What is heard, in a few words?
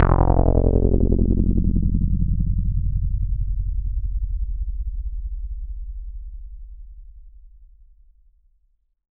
BASS SH09 F0, Synths, Roland SH-09, Bass